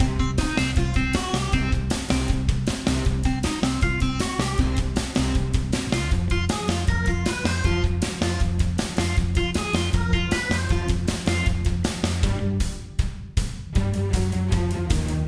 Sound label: City Platforming MIXED; ben 10